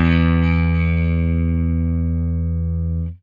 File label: FENDER HRD, Guitar & Bass; Quantum Leap